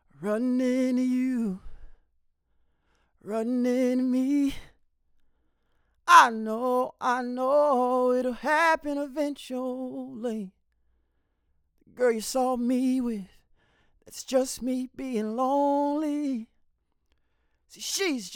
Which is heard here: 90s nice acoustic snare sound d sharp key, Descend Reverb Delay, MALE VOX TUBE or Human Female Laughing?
MALE VOX TUBE